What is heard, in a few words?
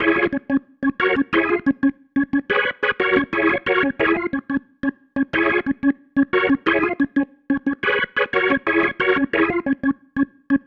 Pattern, Organ